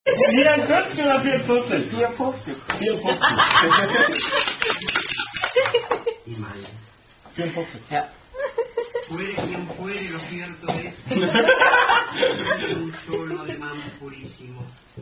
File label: German Dice Drinking Game; Spiel Fluch-M Xchen; Unknown German Dice Drinking Game